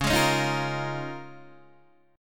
Db 6th Add 9th Db down Guitar DADGAD

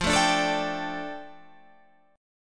F 6th Add 9th F down Guitar Half Step Down, chord

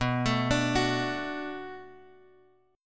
B Minor 7th B Guitar Drop D, chord

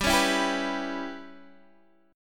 G 6th Flat 5th, Guitar, DADGAD

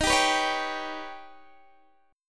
Eb Augmented 7th Eb, Guitar DADGAD; down

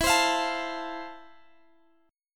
F Major, guitar chord

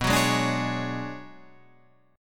B Minor 6th Add 9th B; Bm6add9 Chord {7 9 6 7 9 9} strummed

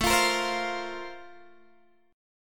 A sharp Major 7th Suspended 2nd

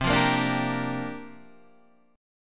C 9th Suspended 4th C down Guitar Open D

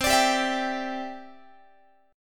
C-Major Add 9th, Guitar; Cadd9 strummed

